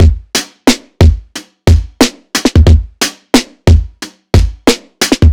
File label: Snare